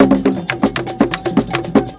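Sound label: 120 COMBO, drum; Best Service Advanced Media Trax Modern Composer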